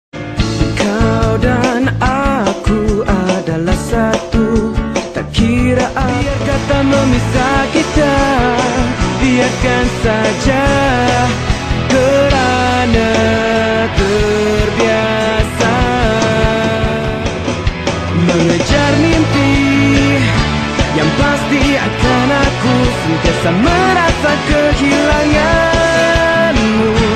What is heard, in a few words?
Ringtone